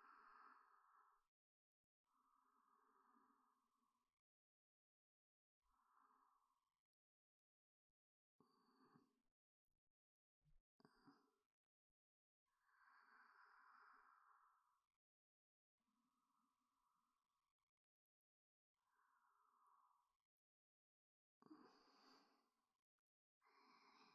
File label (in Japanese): 寝息抽出; KU100・添い寝・あまから; 後輩彼女と甘々おふぃすラブ